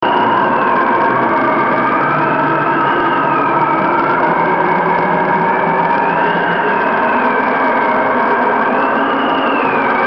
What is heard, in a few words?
Cold Wind